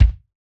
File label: Jazz Kick alt; Roland BK-5